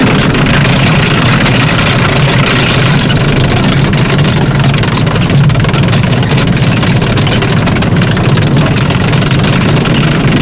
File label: tracks speed, tanks; War Thunder